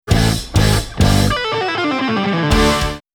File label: Blue Tone Fill